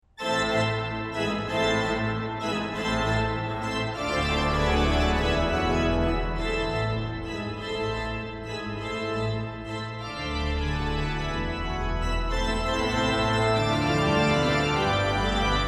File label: Concerto Classique, ORGUE